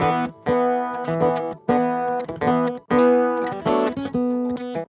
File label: Traditional Guitar 098bpm E, African Guitars; Quazibeat - African Secret